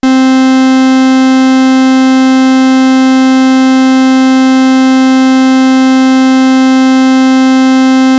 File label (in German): Eine Art Saegezahn; Bullfrog